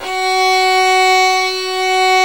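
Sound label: violin tenuto F#3, solo violin; AKAI S-Series CD-ROM Sound Library VOL-7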